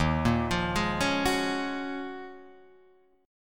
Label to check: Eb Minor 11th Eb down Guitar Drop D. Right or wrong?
Right